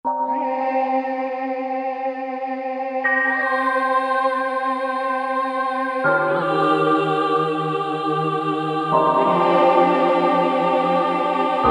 Gong Choir, Korg MSC-8S Percussion

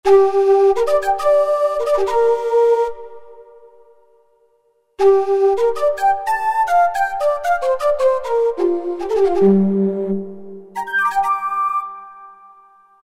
Shakuhachi; Roland MT-32

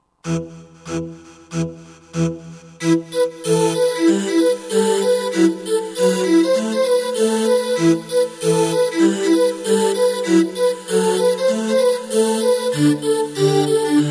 HPF Synvox; Roland SR-JV80-13 Vocal Collection

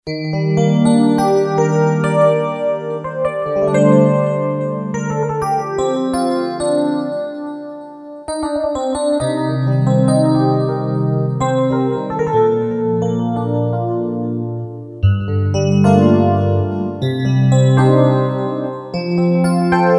Venus EP, Yamaha PSR-550; Voice

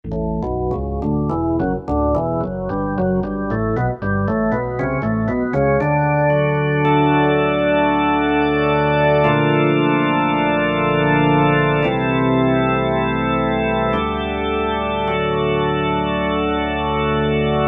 E. Organ, Roland U-220; Factory Patches

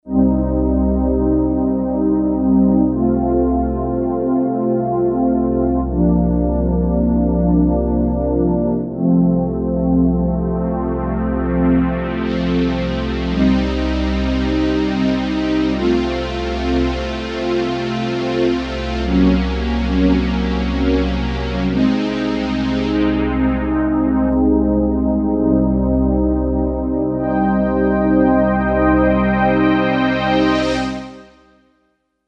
Junova, Kawai K5000S factory presets

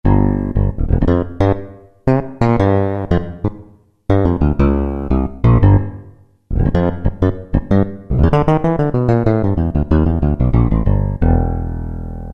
Fingered Bass, Roland PN-D50-02

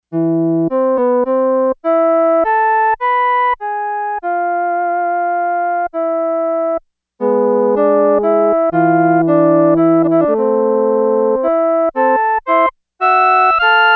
Wood Wind, Yamaha DX11; Factory Preset Voices

